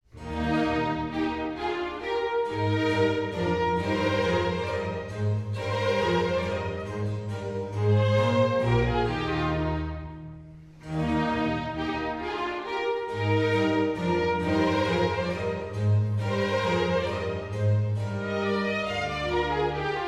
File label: Concerto in E Flat Major for Horn and String Orchestra - Moderato; Baroque.horn